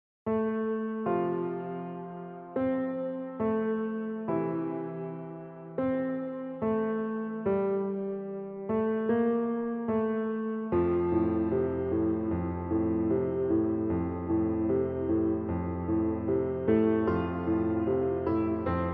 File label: piano theme